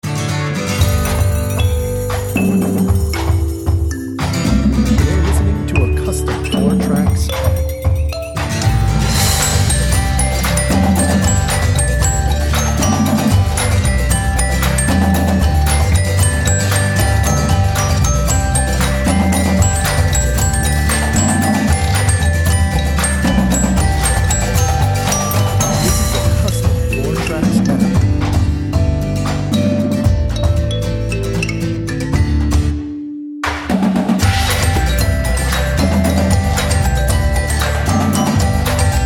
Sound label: happy dance